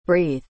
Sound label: breathe